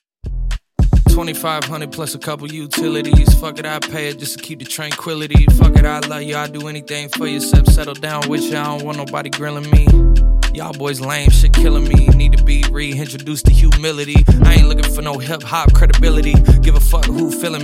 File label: Funk Intro Outro 109Bpm